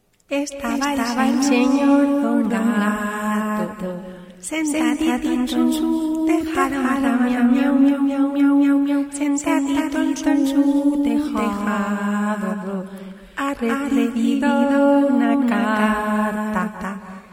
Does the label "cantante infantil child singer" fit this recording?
Yes